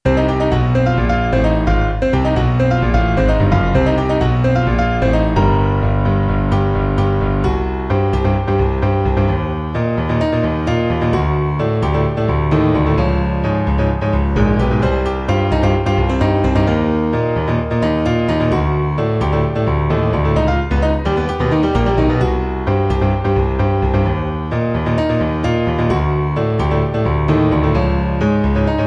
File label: piano arrangement; jjba battle tendency